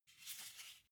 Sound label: car flip key handling short